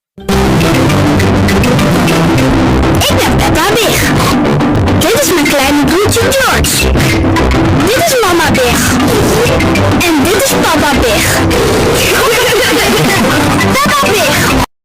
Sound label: big bass; soundboard; peppa